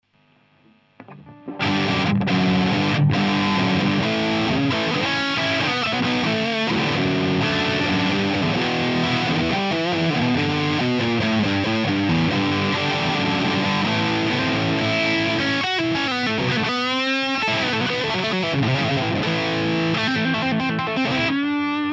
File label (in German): Laney VH100R Tuning II, Drive Gain halb, Drive voll